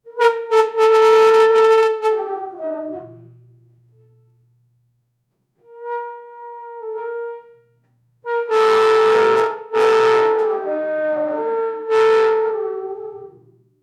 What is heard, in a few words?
a tuba playing screaming; instrument